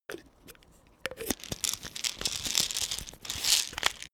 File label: pillbox open